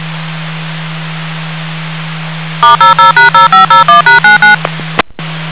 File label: dtmf page slow